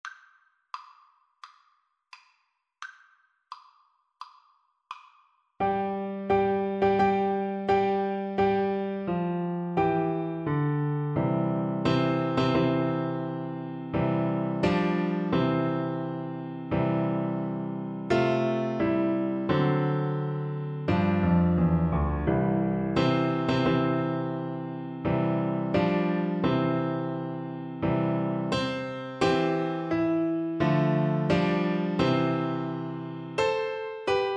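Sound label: recorder karaoke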